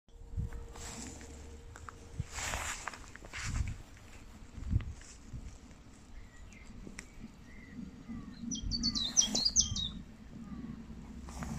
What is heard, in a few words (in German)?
fitis